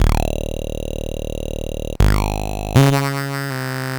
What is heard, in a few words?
Sh09 Bass Loop, 120BPM; musicradar; bitcrushed; Crushed Loops